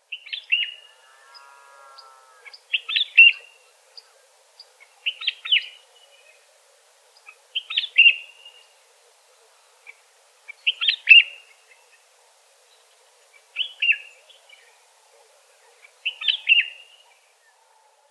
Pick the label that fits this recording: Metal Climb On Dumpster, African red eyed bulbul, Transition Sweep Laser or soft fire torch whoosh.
African red eyed bulbul